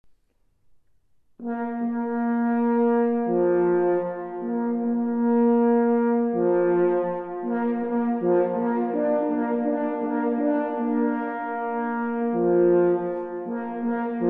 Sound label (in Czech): Halali